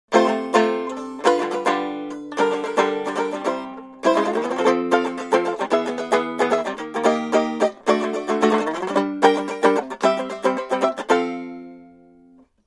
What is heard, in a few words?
TENOR BANJO